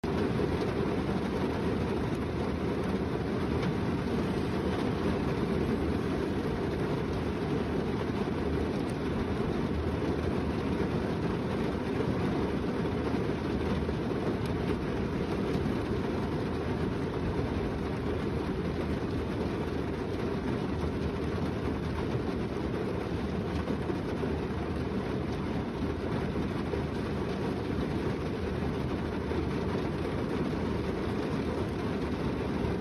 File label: Rain inside car